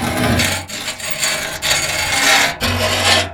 screech, crash; stunt rally

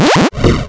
firework; project hawk

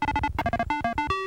WLD ENTER SND; commander genius

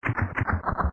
water drain begin; zsdx

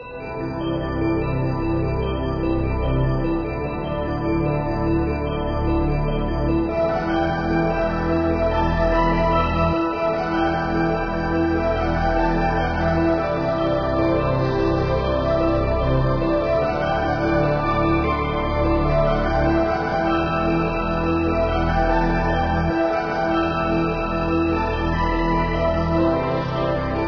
success; race-into-space

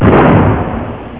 explosion huge; endless sky

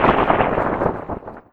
ion impact; endless sky

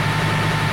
diesel medium; rigs of rods

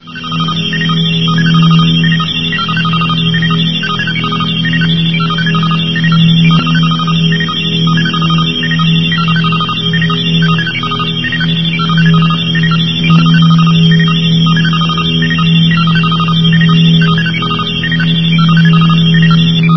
radar; race into space

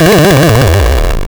death; roomforchange

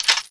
pickup ammo clip

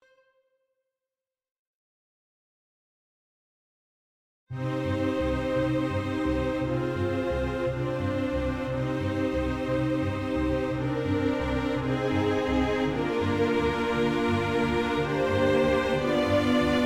stings; freeciv

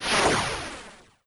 meteor; endless sky

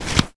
pickup armour

